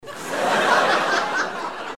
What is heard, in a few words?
laugh